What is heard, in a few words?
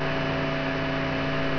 buzz, binoculars; hl2